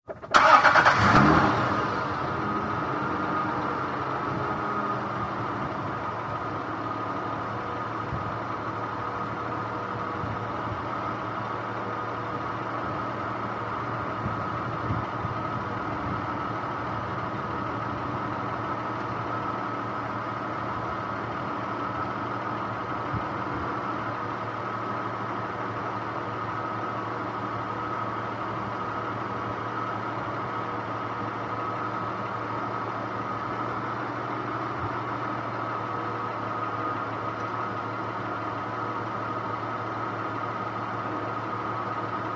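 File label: SUV Start Engine Idle Off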